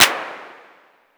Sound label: vermona drm1 mk3 clap